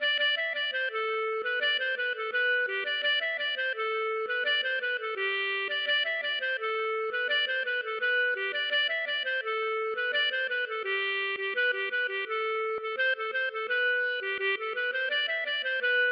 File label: carillon; Le carillon